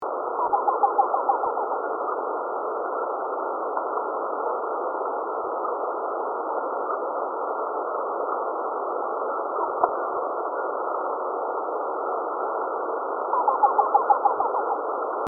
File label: grey capped cuckoo